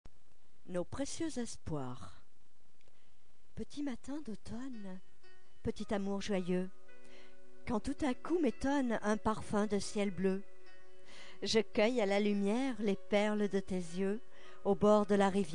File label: piano